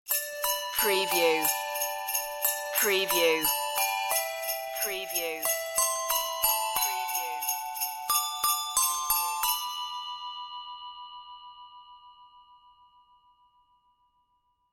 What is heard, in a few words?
jingle bells handbells sleigh